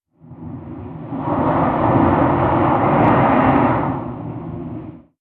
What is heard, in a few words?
ambient port default; World Of Warships